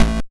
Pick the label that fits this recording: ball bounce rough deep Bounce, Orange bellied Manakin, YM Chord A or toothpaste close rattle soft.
YM Chord A